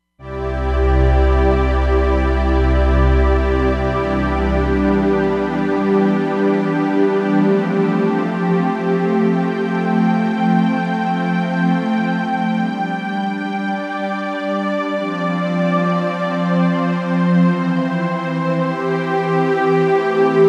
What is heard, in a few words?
Jupiter-X, Roland Fantom XR